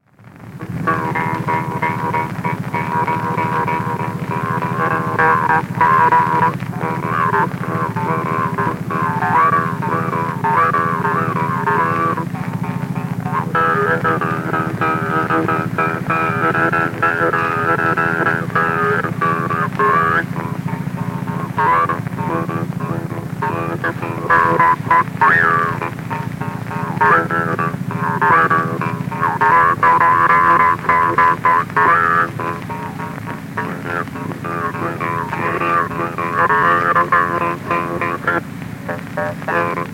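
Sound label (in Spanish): Toque de trompe, Mapuche; Zapala, Neuquén, Argentina; Música Mapuche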